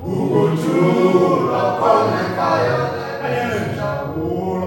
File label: UGO CHU, CHOIRS; Spectrasonics; Heart of Africa